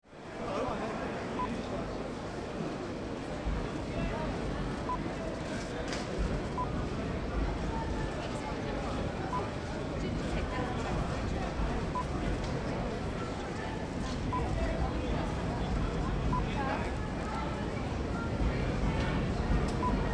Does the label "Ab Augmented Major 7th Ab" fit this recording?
No